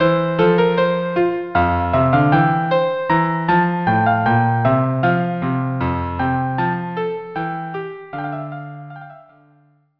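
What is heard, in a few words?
Gaillardise violin cello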